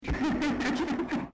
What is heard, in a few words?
laugh; cstrike